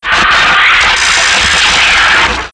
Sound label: Laser A Release